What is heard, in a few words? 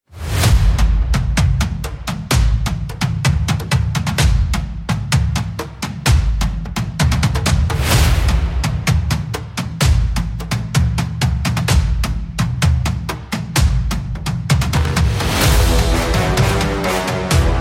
hero brass riot